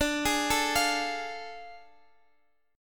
A Major 7th Suspended 4th Sharp 5th

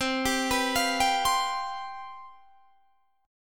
C Major 7th Suspended 4th, guitar

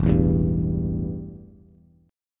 E Minor Double Flat 5th, Bass; 0,0,2,0; strummed; JGuitar

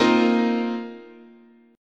Bb Suspended 2nd Suspended 4th, guitar chord

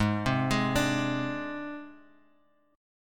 Ab Major Flat 5th, Guitar, Open G; 6,5,6,7,x,x; down

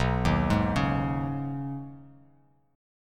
B 6th Add 9th B down Bass Standard; B6add9 arpeggiated; chord shape; JGuitar

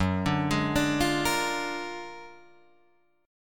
F sharp Augmented Major 9th F sharp Guitar Open D

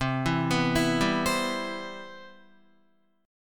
C Major 7th, Guitar

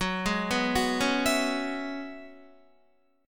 Gb 7th Suspended 2nd Suspended 4th, Guitar; Gb7sus2sus4 arpeggiated

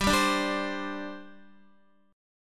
G Suspended 4th, Guitar; Gsus4 strummed; x,10,7,7,7,8

